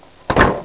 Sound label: Close